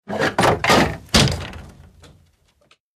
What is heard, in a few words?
Hanging Kick Awy Chai, crashes & creaks